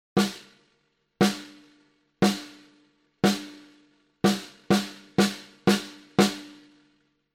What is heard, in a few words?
snare comb